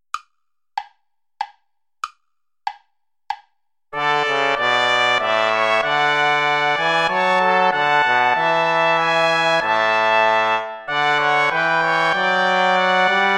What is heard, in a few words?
play along without trombone